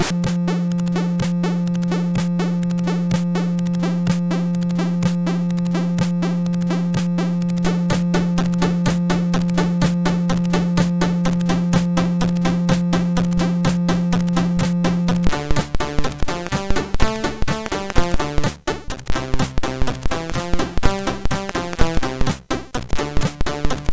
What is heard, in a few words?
Shortest, sid MOS6581